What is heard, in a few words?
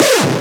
Synths C#; Vengeance Samples